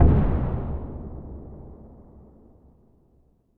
Roland TD-20 Percussion

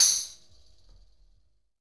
Tambourine Hit; Essential Percussion

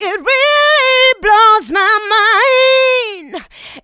Female Vocals; Houseworx

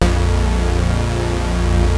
Prophet 5 F2; Hollywood Edge - Giorgio Moroder Rare Synthesizer Collection

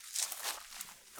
Rustly Step, Footsteps, outdoor

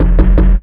Ragga & Dub Reggae PERC

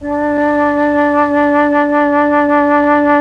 FLUT 1; ILIO; Propeller Island Legendary M400

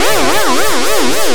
FX LOOP; Big Beat Samples & More; AKAI; FX LOOPS KIT

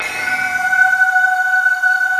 bamboo, Industrial; Optical Media International; Sonic Images Library

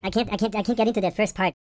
YODEL YELL; ILIO; Vocal Planet VOL-5

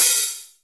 HHOP

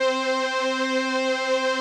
505, String Machines

